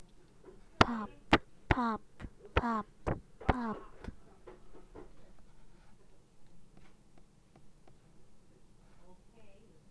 pop

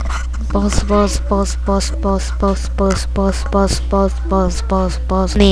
buzz

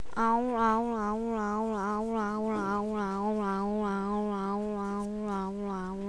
owl

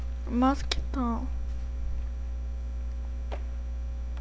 mosquito

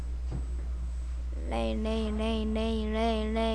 rain

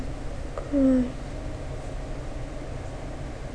cry

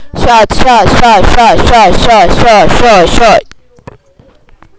shot

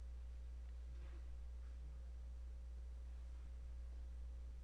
rat

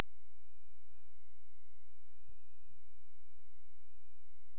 drum

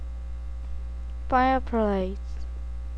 fireplace

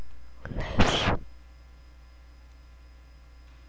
laugh

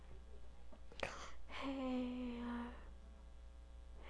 hammer

